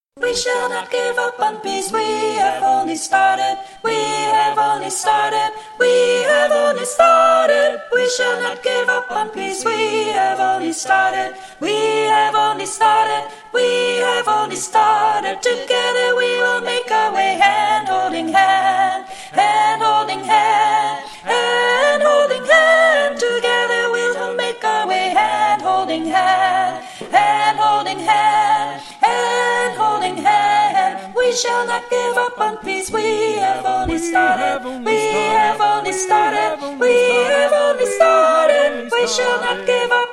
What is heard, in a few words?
soprano